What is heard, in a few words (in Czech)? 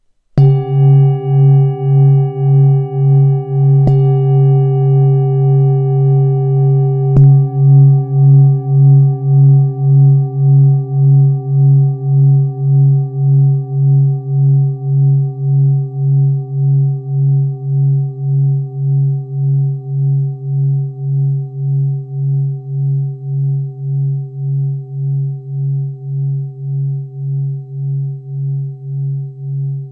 tibetska misa; 21cm